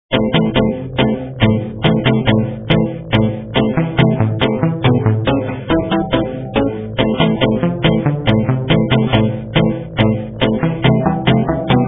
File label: shortbeat